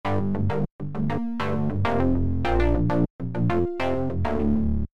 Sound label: shape fuzz, warm distortion